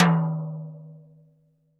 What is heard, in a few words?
TD-20 Tom A, Roland TD-20 Toms